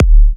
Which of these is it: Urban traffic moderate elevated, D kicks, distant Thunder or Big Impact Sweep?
D kicks